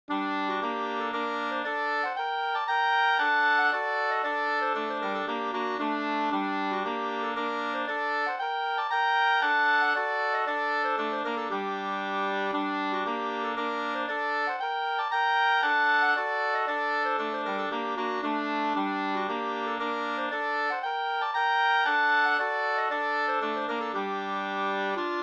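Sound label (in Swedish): polska, tvåstämmig; Hov; Nils Bergholm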